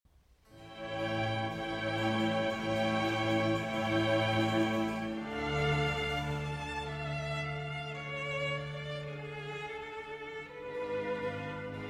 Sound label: Concerto for 2 Trumpets in C Major, Largo; Vivaldi; RV 537 II.